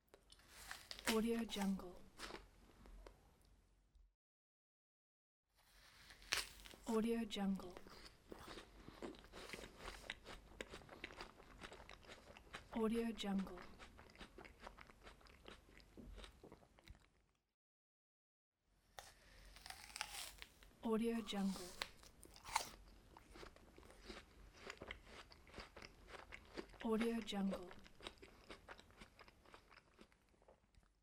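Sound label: apple biting and chewing; ambiencerecords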